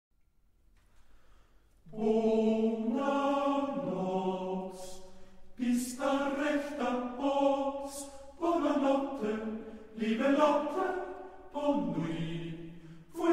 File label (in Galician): coro; Grupos Traballo; pau exames